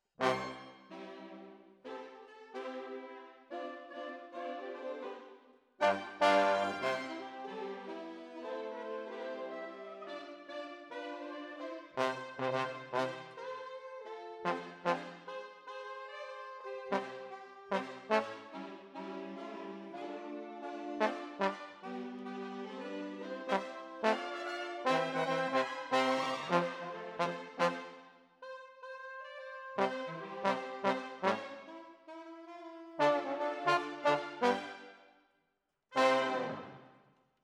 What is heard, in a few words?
Trombones, jazz for piano